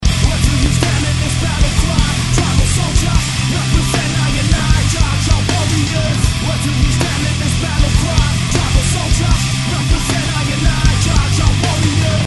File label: Tribal